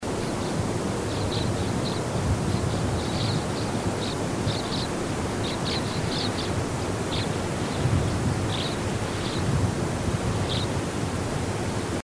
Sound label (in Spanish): catiserrachica, Catita Serrana Chica, Bolborhynchus aurifrons, Mountain Parakeet; cantos